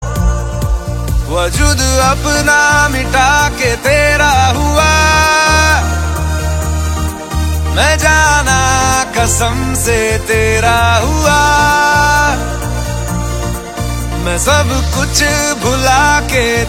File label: Hindi romantic song tone ringtone; bollywood ringtones